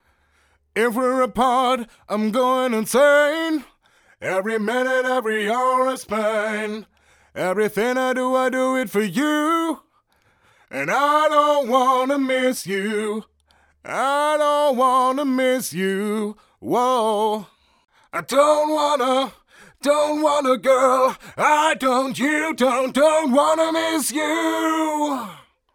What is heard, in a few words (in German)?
Modulationseffekte zur Stereoverbreiterung Chorus